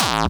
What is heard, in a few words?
BD Distortion, Bassdrums; Vengeance Samples